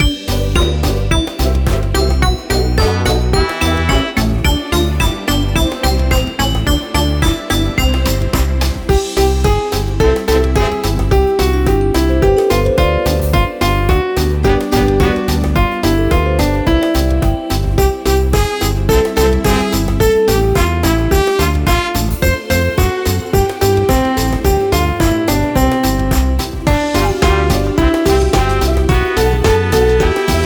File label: karaoke; минус детской песни; минусовка